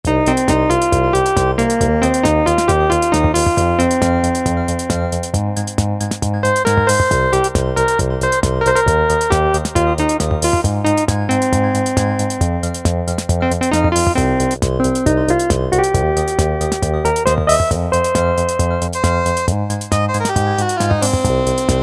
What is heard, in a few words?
performance, Casio MT-68